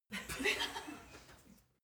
laugh female short

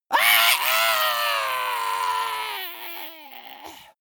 monster death scream high

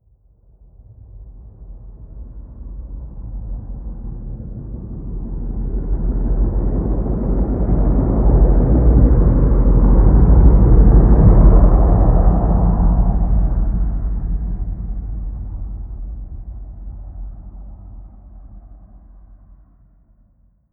flyby

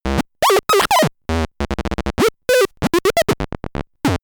talking arcade

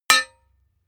hammer hit stone short

